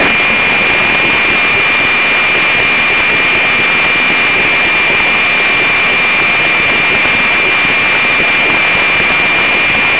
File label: static